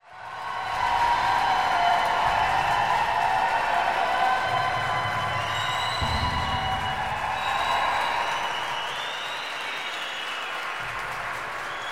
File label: crowd med, spectator